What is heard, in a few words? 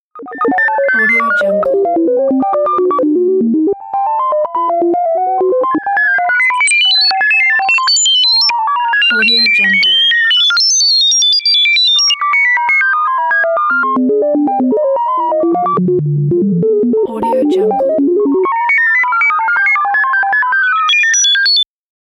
computer calculations